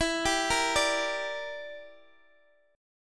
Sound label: E Minor 7th Flat 5th E, Guitar Open D